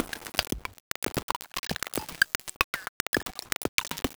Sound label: Crunchy, Beats